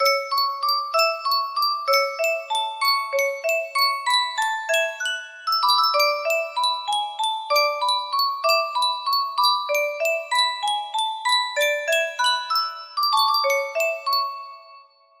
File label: music box, music box melody